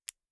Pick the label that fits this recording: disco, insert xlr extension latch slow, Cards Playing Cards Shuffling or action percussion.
insert xlr extension latch slow